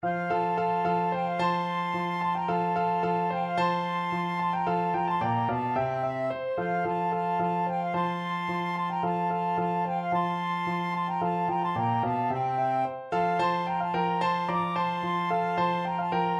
spring longer, flexible ensemble and piano 3 players and piano